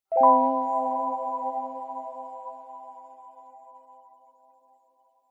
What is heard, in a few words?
multimedia game sound zen game soft warm relaxing end finish tone